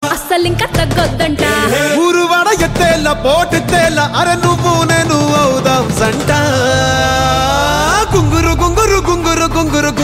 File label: Telugu Song; Viswam